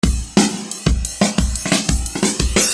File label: flangebreak